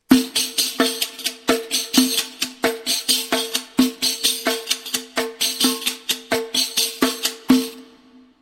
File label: riq